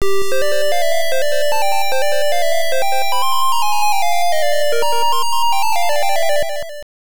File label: Imperfect Result in Challenging Stage, MSX; galaga